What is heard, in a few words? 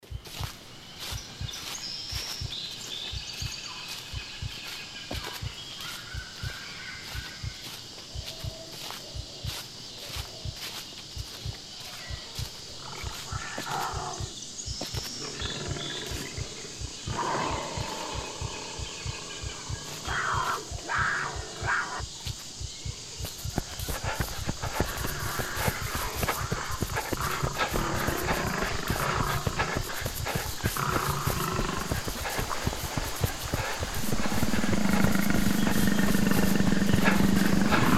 jungle